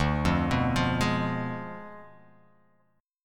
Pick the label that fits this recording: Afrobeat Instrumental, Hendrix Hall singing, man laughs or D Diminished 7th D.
D Diminished 7th D